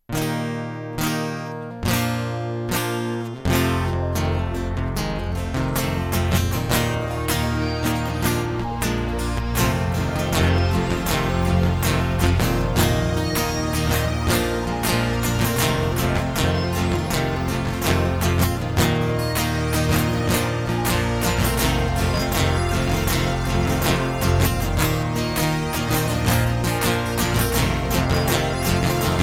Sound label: Instrumental; hyper terrestrial